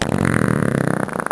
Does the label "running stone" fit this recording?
No